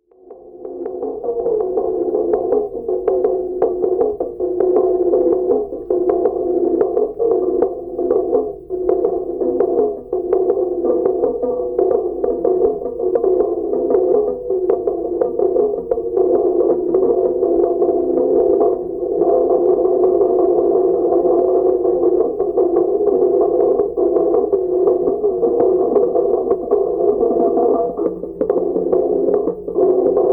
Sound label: shrine dictorphone mexico, street recording